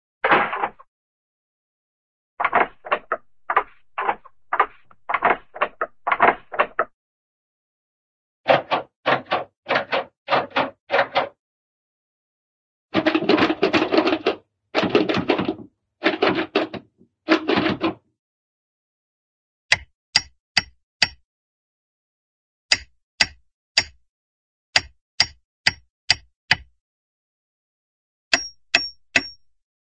door, knob short rattle; cartoon

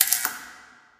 light flicker; Source Engine; Portal 2

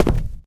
wood, footsteps, walk

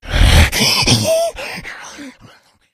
death, infected; Left 4 Dead 2; Source Engine

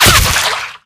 bullet impact, gore; npc; bullets; Left 4 Dead 2; Source Engine; infected

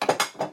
dinner plates, ambient materials; Source Engine; video game

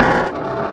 future shoes, player; video games; source engine; portal

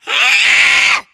spitter spot prey, voice; source engine; left 4 dead 2

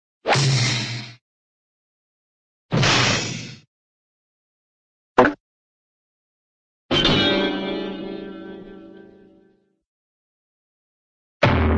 hit, cartoon big head hit

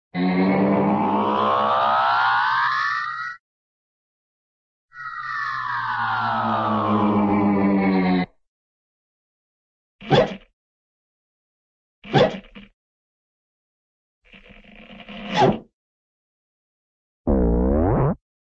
stretch, cartoon stretch, forward; plastic man

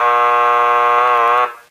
lowscore; Left 4 Dead 2; Source Engine

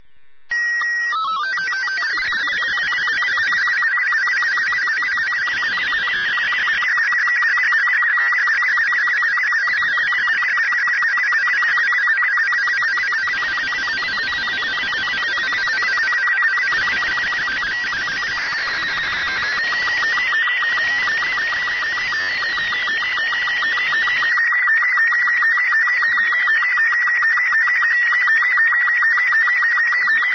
ambient; Portal 2; Source Engine; video games

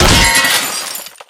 robo impact hard; source engine; tf; mvm; physics; video games

